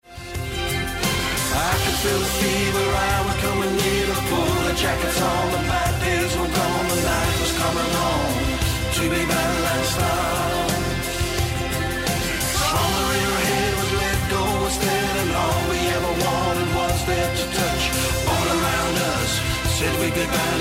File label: Strings and Piano